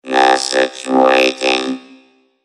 Message robot

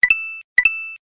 Coin coin

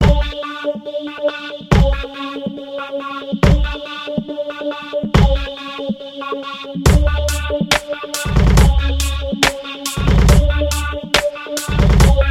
Rap Girl

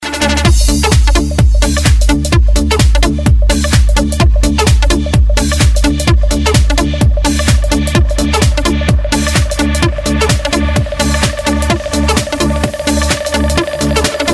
High Tension

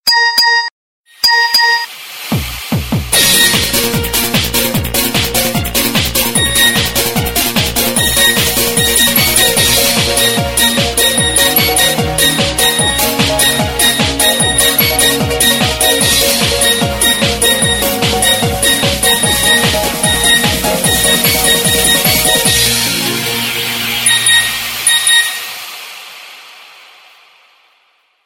Nokia Super Mix

